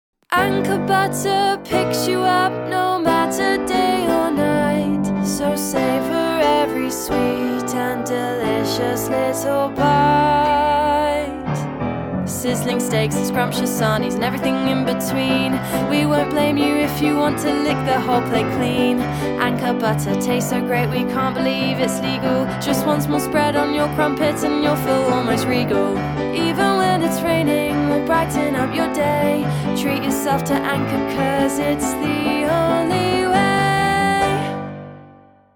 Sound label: Intro Original Ending